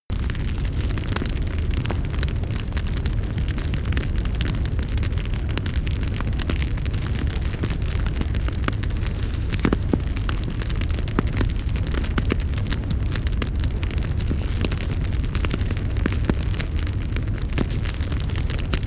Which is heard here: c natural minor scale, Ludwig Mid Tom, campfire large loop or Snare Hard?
campfire large loop